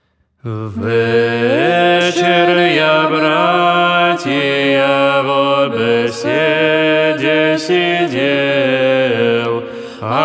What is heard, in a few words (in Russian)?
Хор Старообрядческой Поморской Общины Великого Новгорода Вечер я